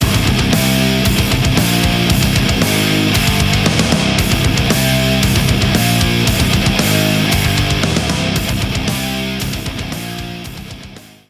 Stinger; Wasteland

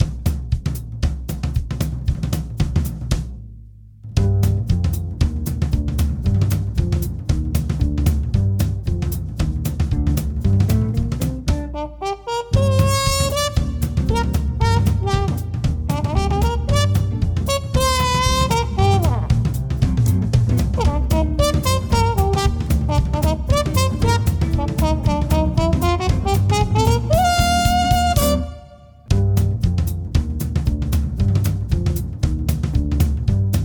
Big Band; Spore unofficial galactic score

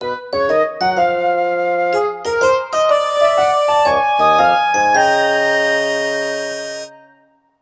Bari Sax

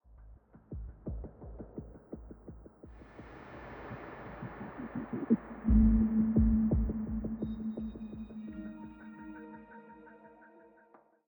85bpm; Legends of Pegasus; windows gamerip 2012

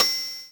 Metronome Accent; puchicon; dsi ds

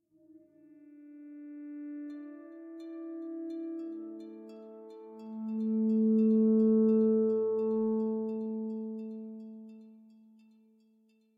Tension Creepy

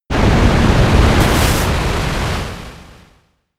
Whirlpool turn damage; Pokemon; gen 7; SUMO; USUM

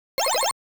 Cannon Hit

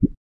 Ui World Map Hover Out

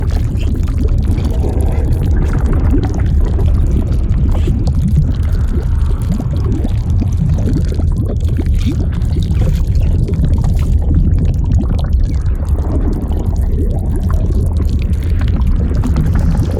Lava Waterfall; Level4 Hidden World

